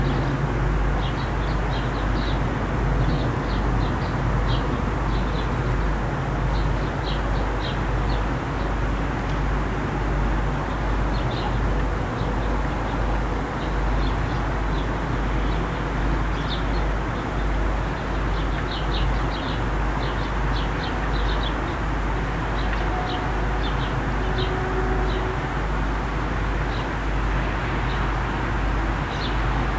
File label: birds and street after rain; Birds recorded from an upstairs window after a good shower. There's a lot of distant street noise on this recording.